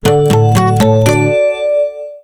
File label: Orange Voice, phonetones; Orange Poland